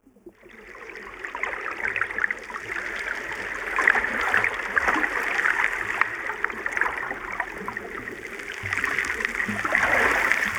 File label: water cicadas maple keys steel stones